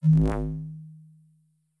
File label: disintegrate; dos lem